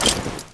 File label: grenade throw, weapons foley; Spearhead Demo; MacFormat 2003 June